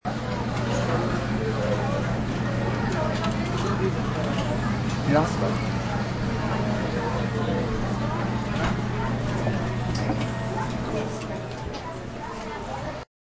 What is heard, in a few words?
aircon